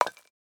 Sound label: stone success; CosmicRage